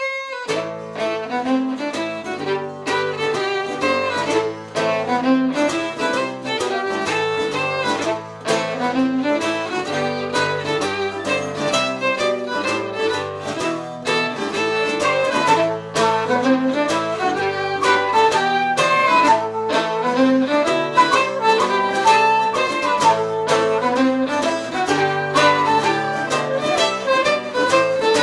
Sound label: Orchestra Ambience; Assassin's Creed III